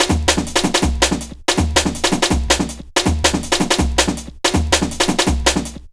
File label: 162, breaks, Loops; M8